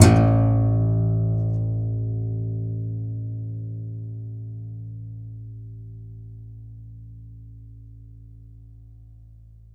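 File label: contrabass balalaika, Plucked Strings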